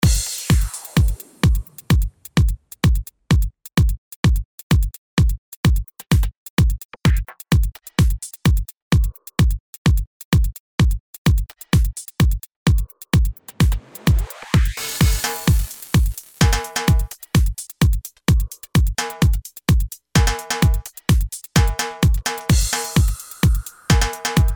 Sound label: Funky Noise percussion